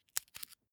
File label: match take